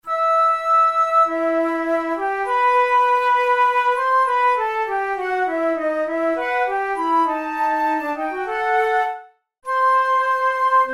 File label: duets, flute; kohler